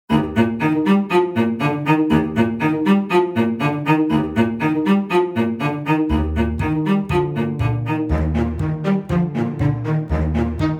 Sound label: classical bright